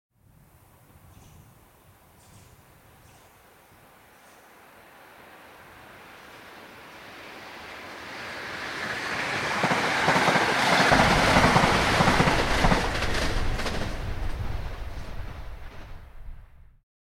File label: Train Fast; 100 sound effects